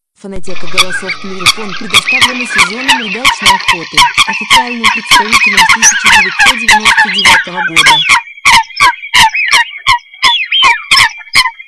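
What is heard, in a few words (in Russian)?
Лысуха, манок; plurifon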